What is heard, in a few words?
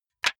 wood board small drop; objekte; holz